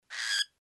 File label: cockatiel